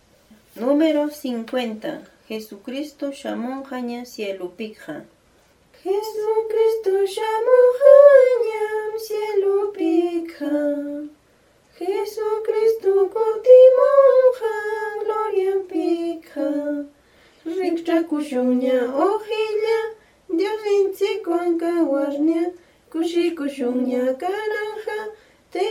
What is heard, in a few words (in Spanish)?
cancion